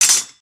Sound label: tools, wood metal; wood metal SFX